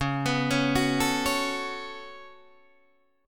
C sharp 7th Suspended 4th Sharp 5th C sharp Guitar Open D; down; chord shape